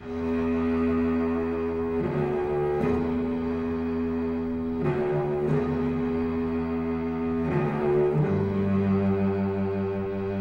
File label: strings, Loops, Sad